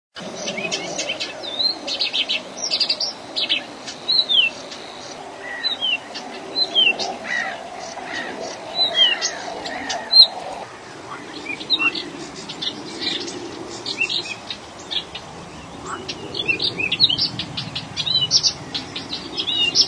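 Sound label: garden warbler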